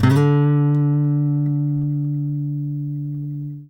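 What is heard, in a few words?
trill, Guitar; Quantum Leap; Guitar & Bass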